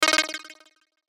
Synth Chopper; Chopper, Distorted, Pitch, Dip; Multimedia; Production Element, Imaging Element, Accent, Transition; Choppers; Blastwave

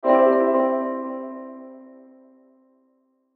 Sweeper Piano Delay Filter; Production Element Imaging Element Accent Transition; Sweeper Sound Effects

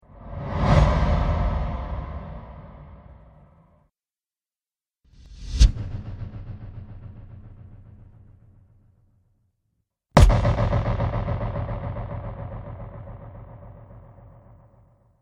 Cinematic Drum Hits; Hit Sound Effects; sound design

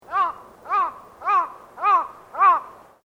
Bird Crow Call Static, Japanese birds; Animals

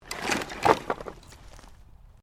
Wood Movement Minor; Material components for constructing more complex sounds; Materials

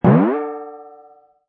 Cartoon Tympani Bend Up, high boing.; Comedy/Cartoon

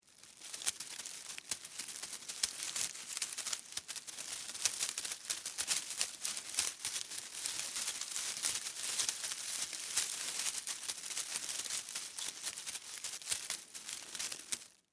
Spider Tarantula, Walk, Leaves, Foliage; Animal; Long, Arachnid, Insect, Bug; BlastWave

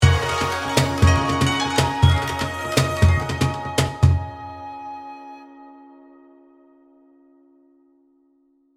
Oriental Accent, Strange Tone; Strange Tone Sound Effects; Strange Tones (Sound Effects:Sound Design)

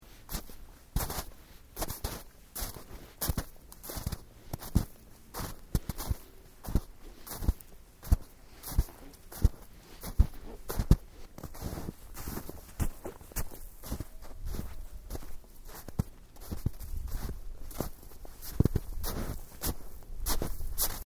Footsteps Boots Snow Sequence; Footstep Foley; Human

